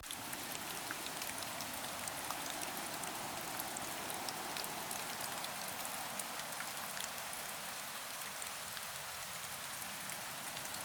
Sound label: ambiance rainy